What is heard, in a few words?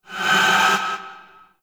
Void Charge; Weapons; Warframe; Duviri; Incarnon Adapters